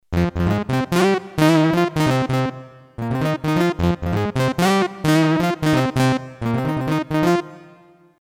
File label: moog, korg n1r; Minimoog